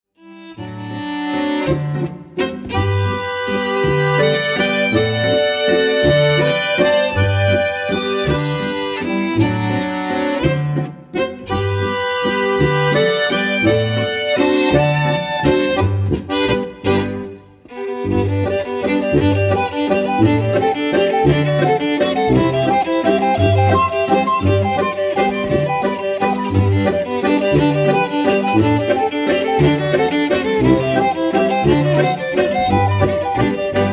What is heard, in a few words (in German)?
Predigt Walzer